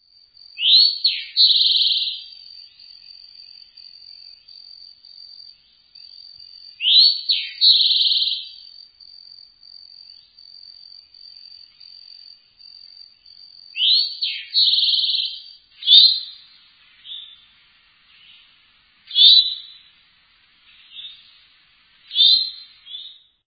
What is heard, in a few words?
Eastern Towhee